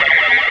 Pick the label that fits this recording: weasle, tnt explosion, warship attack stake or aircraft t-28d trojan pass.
weasle